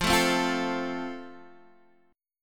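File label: A Minor Sharp 5th, chord strum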